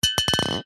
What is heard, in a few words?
aerosol cans x2 deodorant bash together; zapsplat